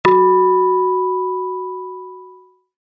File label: tone